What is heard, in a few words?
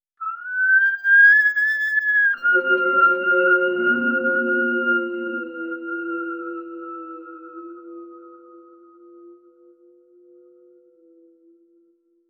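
a slow eerie human whistle; mysterious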